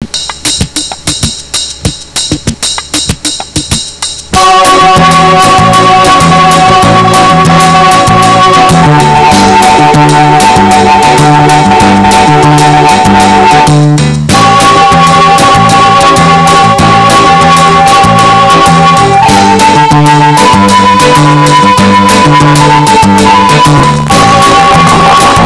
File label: concerto